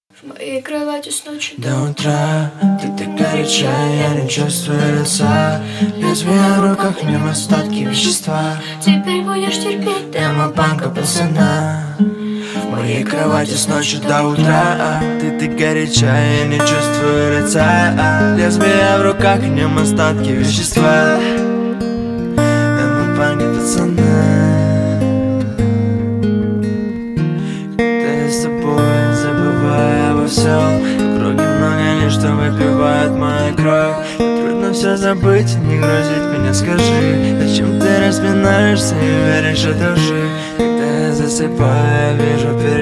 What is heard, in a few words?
acoustic